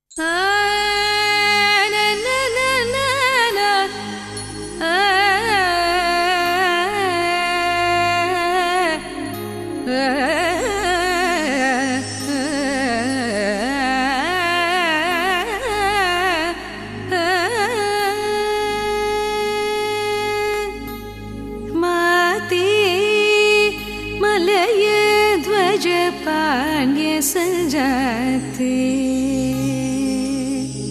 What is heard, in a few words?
morning raga